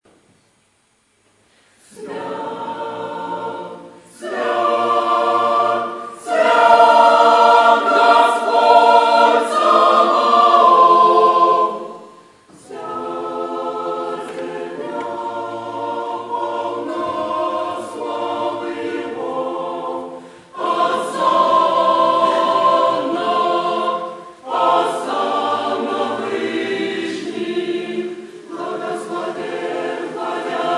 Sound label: choir